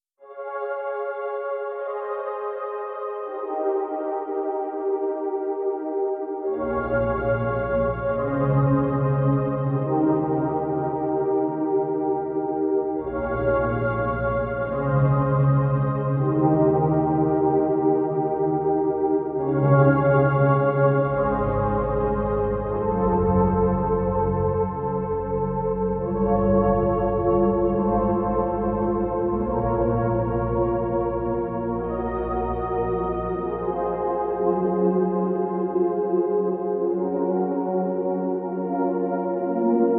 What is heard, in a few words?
Mistery Tremolo